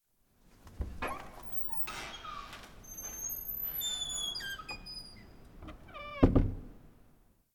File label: Men S Bathroom